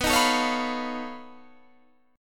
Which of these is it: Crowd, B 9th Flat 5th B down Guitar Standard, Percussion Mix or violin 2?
B 9th Flat 5th B down Guitar Standard